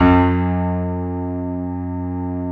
EPM PIANO, KEYS, Synthesizers

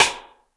Roland U110 Rock Drums C#1

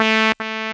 lead syn, Techno Trance

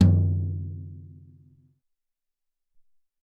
Brs Tom, Alesis SR-18

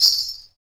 METAL, Afro Shakers Ankle Pods; Roland LCDP14 Africa VOL-2

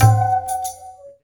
PRC WHIR, Perc Toys; Spectrasonics; Supreme Beats; World Dance

